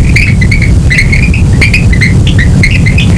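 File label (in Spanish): El Coquí de Mona (Eleutherodactylus monensis); Isla de Mona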